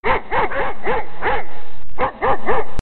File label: Sms Tone